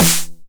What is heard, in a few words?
909 SD Tape Sat